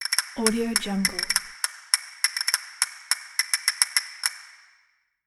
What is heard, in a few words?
castanets